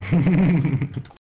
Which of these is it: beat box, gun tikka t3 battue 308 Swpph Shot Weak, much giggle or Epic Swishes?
much giggle